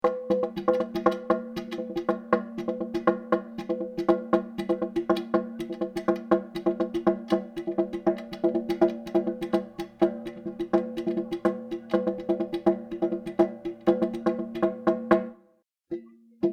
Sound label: Bell